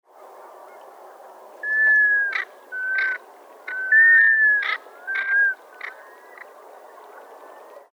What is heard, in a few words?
Black Scoter Melanitta americana